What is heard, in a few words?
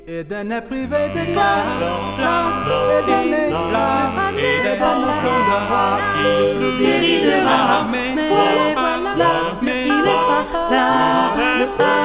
vocal